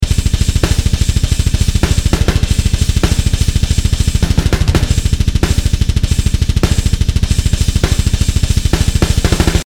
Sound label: 32nd DB Groove